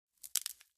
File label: Bone Break; punches kicks hits bones